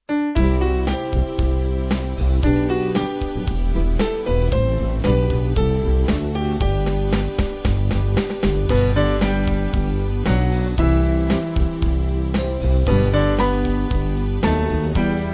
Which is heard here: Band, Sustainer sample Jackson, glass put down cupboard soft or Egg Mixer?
Band